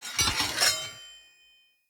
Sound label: Knight Axe Equip; Weapons; Enemy; Axes; Snc Knight Axe